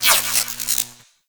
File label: Mag Spark; Archwing Intro; Cinematics